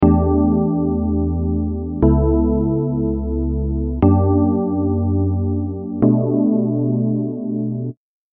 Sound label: Nice Rhodes